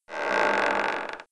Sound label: Floor Door; Playable Tutorial Level